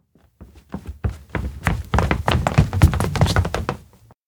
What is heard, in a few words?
steps indoor new hall burst out kids; anemoi パッケージ版 1.1